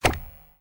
btn Click

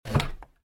safebox move

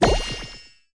star explde

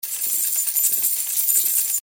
roulette coin

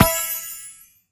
booster collect

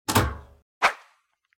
safebox out

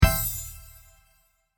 collect

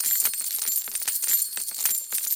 roulette coin

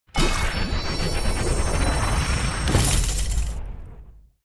safebox gold fly

